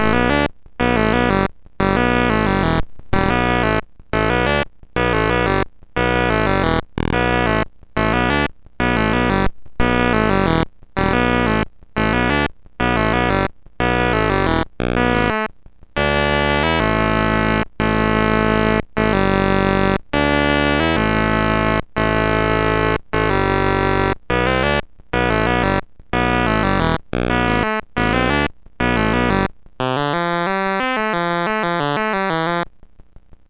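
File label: Musicman, MOS 6581 SID chip music, games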